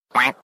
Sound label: Quack; Gunter